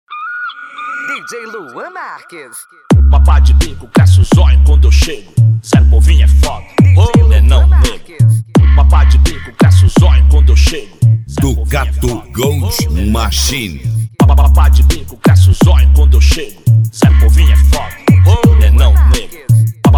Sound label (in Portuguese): especial funk